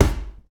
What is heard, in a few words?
hit